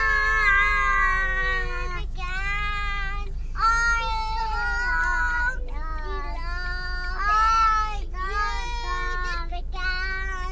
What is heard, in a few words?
my kids singing in the car; voices of angels